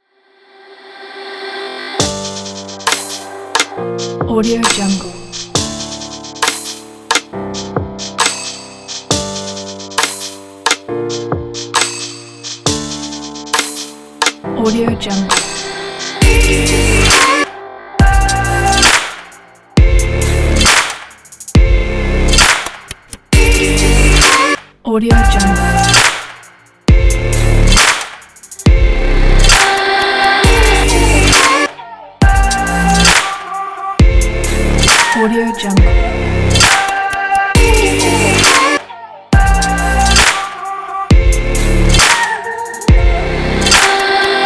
slow motion

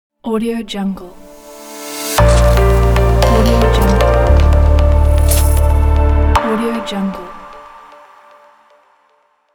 technology intro logo

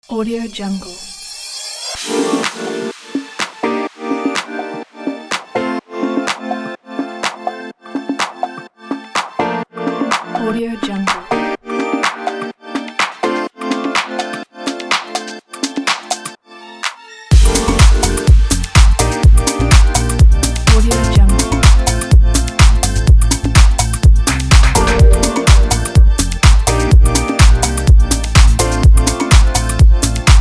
modern lounge chill disco house

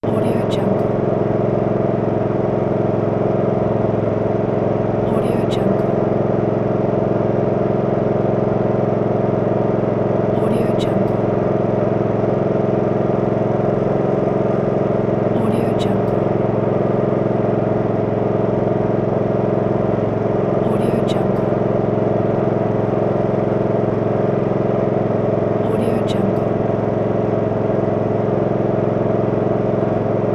electric water pump loop

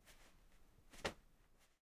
body pat muted; prosoundfx

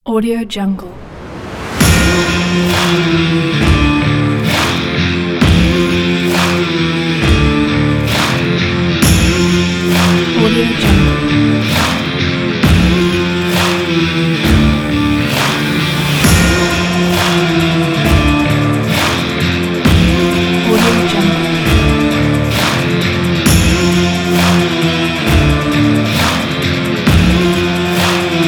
epic western

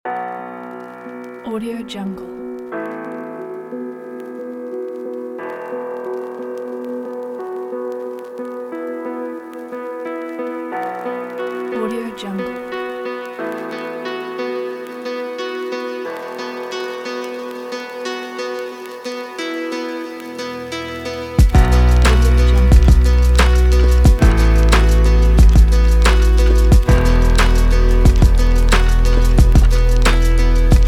documentary trip hop; comastudio

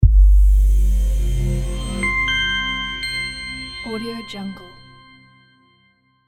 the calm logo

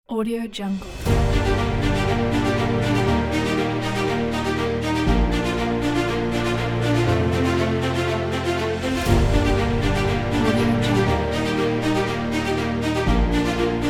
motivational powerful orchestra

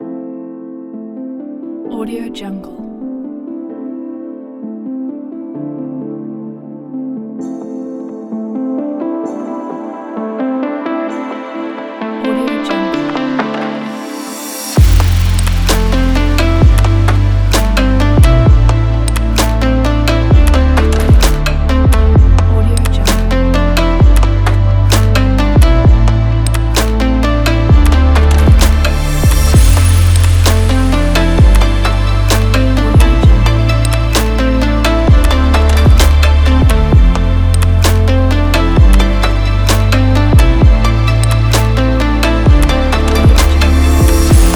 cinematic electronic

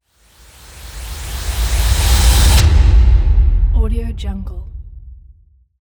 alarm long swoosh

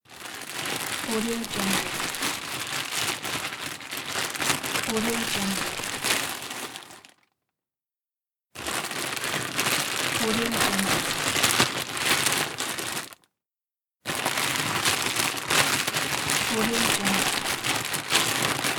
thick plastic rustle

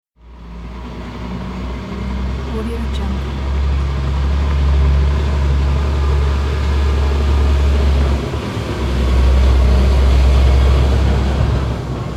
truck passing by on road

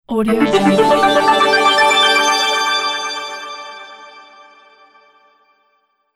modern particle logo; stereogull-productions

